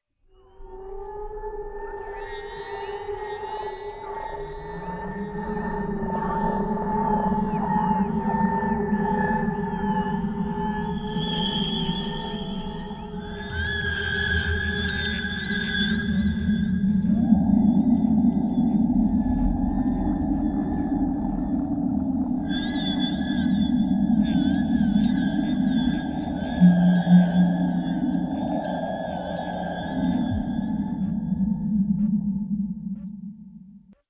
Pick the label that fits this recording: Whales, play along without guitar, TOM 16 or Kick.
Whales